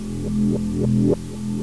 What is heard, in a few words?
Scanning